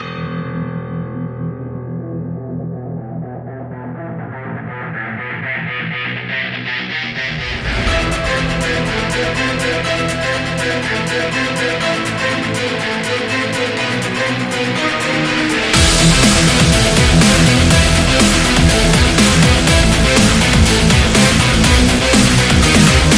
Action Hero

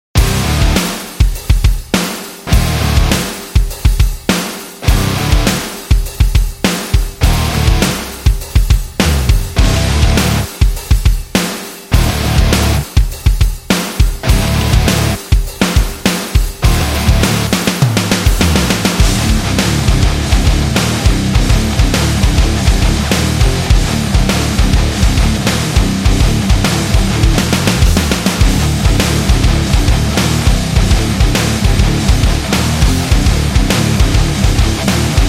Anger, metal

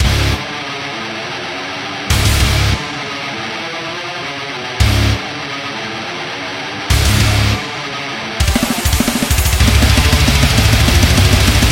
Brutal Death Metal Grindcore

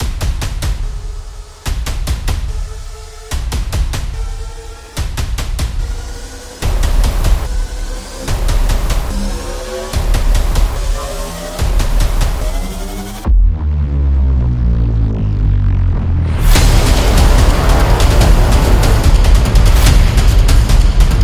Epic Opener; monster attack

